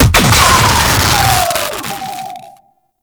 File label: Sentient Search Drone Explosion; Warframe; Tau